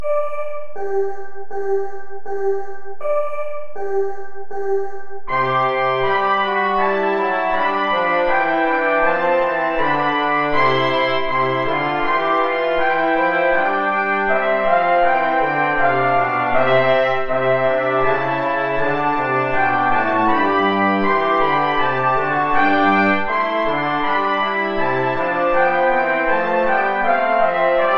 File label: play along without trombone; bach johann sebastian; ach mein herzliebes jesulein